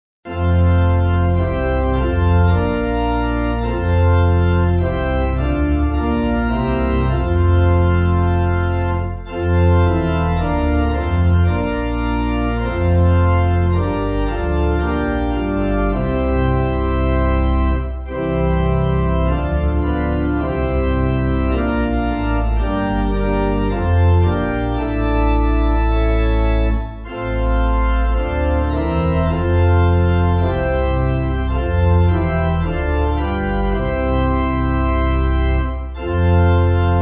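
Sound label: Pipe Organ, Music Backing; We Will Extol; Old 124th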